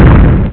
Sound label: Plane Die; Iraq Attack; Arcade